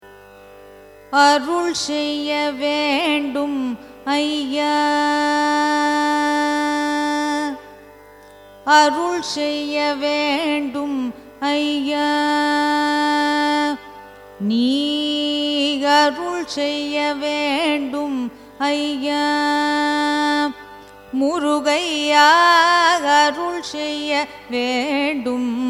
raga rasikapriya carnatic; carnatic music

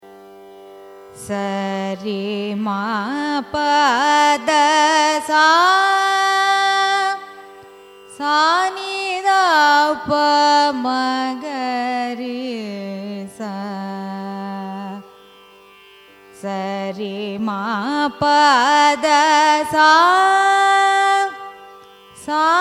raga saveri arohanam avarohanam; carnatic music

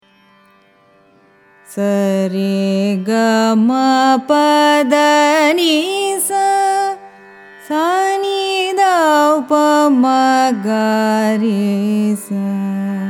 raga ganamoorthi arohanam avarohanam; carnatic music

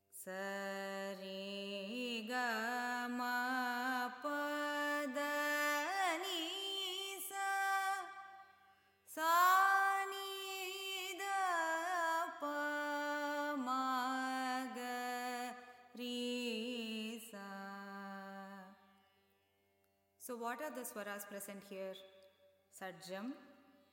varnam shankarabaranam; carnatic music

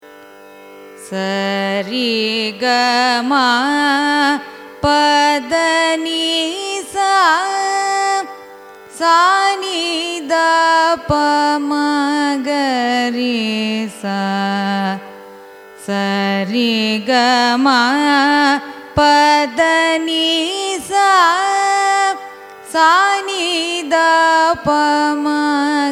raga vachaspati arohanam avarohanam; carnatic music

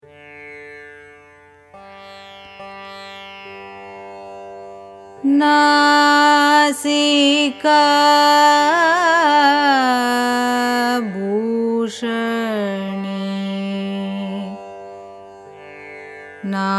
raga nasikabhooshani signature; carnatic music

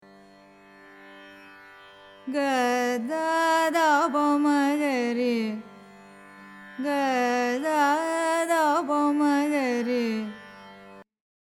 mohanakalyani; carnatic music

